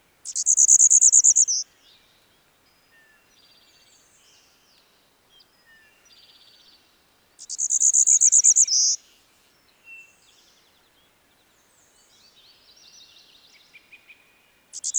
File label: male song, Golden-winged Warbler